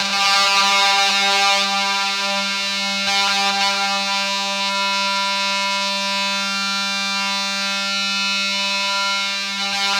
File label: ZWEEP; Spectrasonic Distorted Reality 1